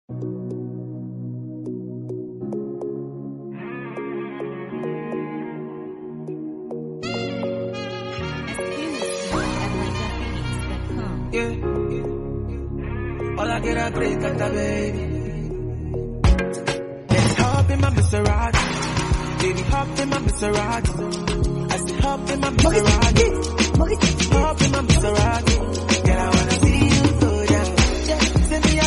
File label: remix sped up